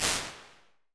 Brite Chamber, Impulse Responses, Medium Spaces, Rooms; Apple